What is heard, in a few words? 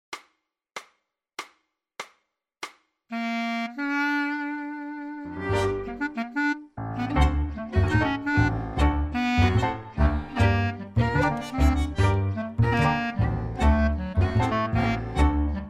clarinet